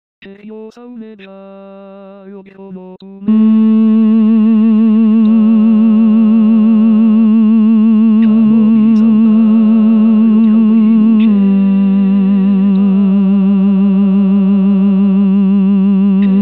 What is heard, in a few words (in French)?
lo dia maria tenor